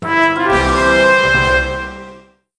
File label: Flourish; Indiana Jones and the Infernal Machine